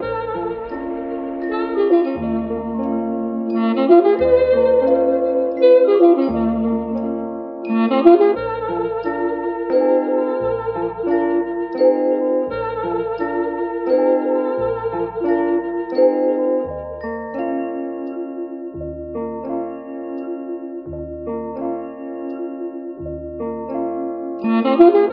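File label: sax romantic, Loops; Phonky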